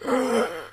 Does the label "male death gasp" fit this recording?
Yes